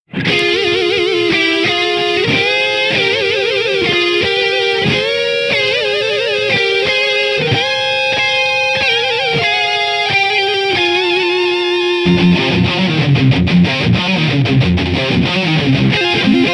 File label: guitar